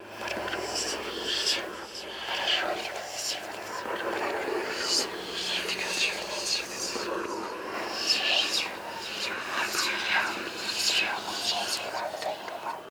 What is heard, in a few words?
Amb Eerie Whisper Loop; Lucius III